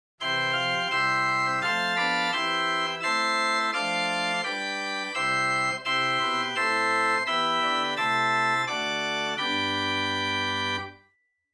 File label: Gedackt Rohrflote Prinzipal Oktave, chamber organ